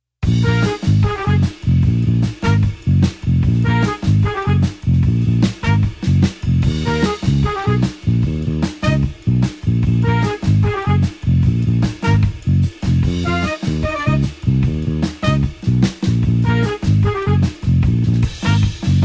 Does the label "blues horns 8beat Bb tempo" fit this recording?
Yes